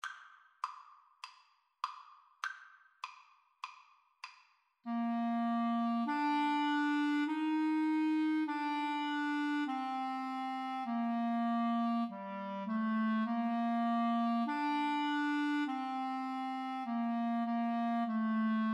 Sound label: Clarinet Duet